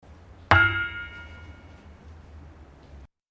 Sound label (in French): cloche; Cunlhat